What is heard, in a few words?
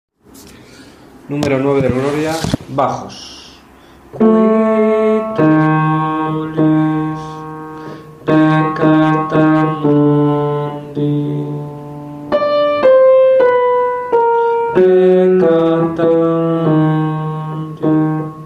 bajo; 9. Qui tolis